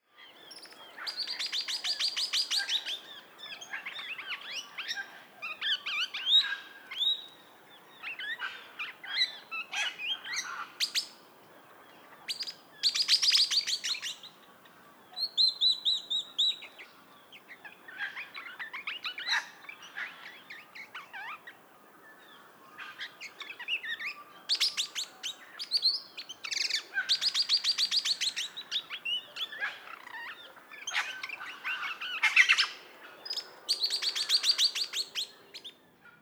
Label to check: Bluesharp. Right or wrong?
Wrong